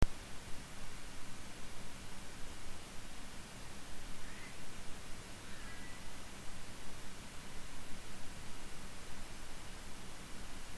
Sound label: Cats meow